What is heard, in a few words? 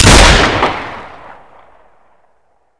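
fire, double barrel; svencoop; hunger; weapons; dbarrel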